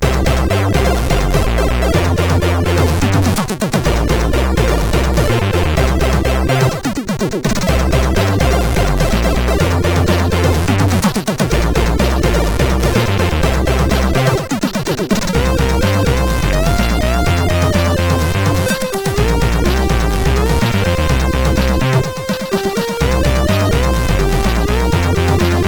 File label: Intro, sid CSG8580R5; Elite